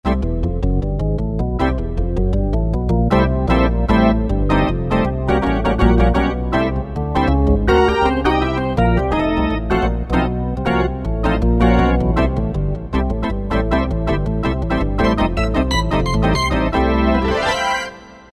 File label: Gospel org., Korg T3